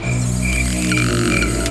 Atmosphere FX

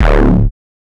bass, D; 808s

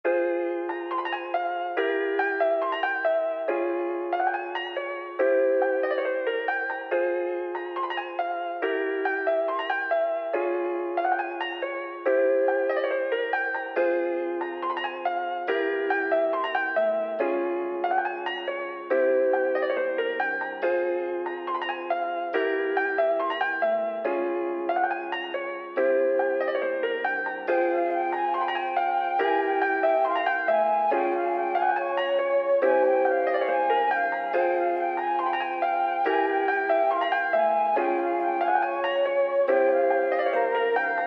V2 140 bpm

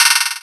Open Hat

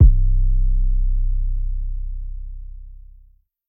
808's

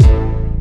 BD, 808s

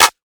Clap

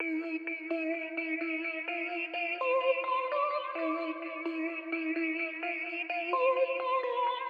Loop

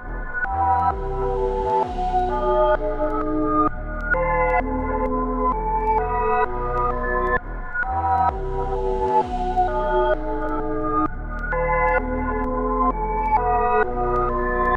loop

